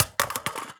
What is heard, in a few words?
phys mag drop ak poly concrete, magazine drops